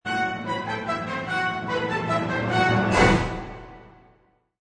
Orch Crec; Orchestra crescendo percussion hit; Orchestral; Musical; Orchestral Sound Effects

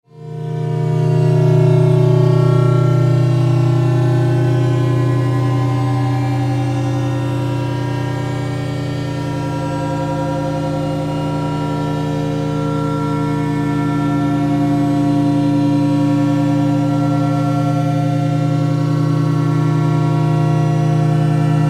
Bed Distortion Pulse Generator; Bed Sound Effects

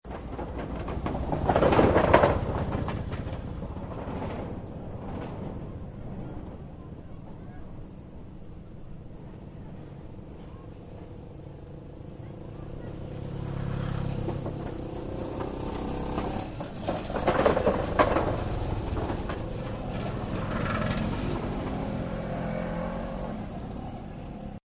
Traffic Bridge Wood; Traffic And Cars

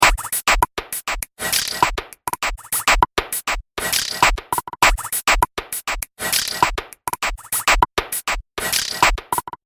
100 Bpm Trip Bop Loop, Triphop; Percussive And Rhythmic; Seamless loop

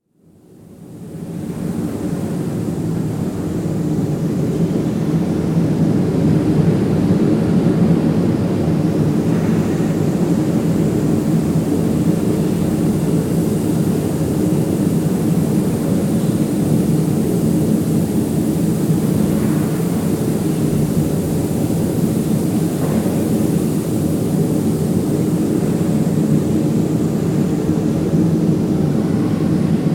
Stainless Steel Making, electric arc-welding shop; Welder Sound Effects; BBC

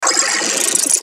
Ascend Tape Rewind; Blastwave

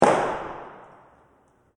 Heavy Firecracker Bang; Fireworks; Crafted Signals

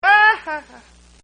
Laugh; Laughter; Sound Effects:Voice; Partners In Rhyme

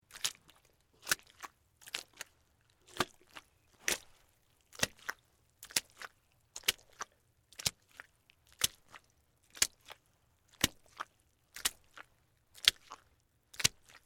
Mud Water Splash, Footsteps, Mud, Water, Splash; BlastWave

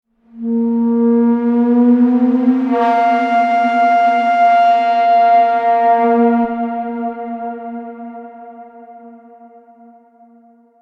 Sound Design-Pressurized Air Release (Reverb); Sound Design - Pressurized Air Or Steam Release From Pipe With Reverb; Hisses; Objects; McKinney Sound

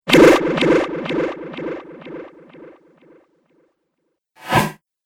Magic Spells; Magic Sound Effects

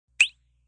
Cheep; Chirps

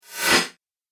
Ghost Whoosh Appear And Vanish; Ghost Sound Effects

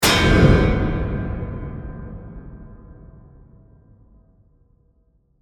Hit Metal

